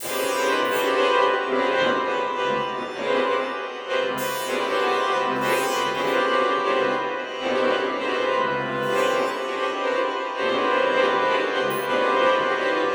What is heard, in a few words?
Strings; Xenakis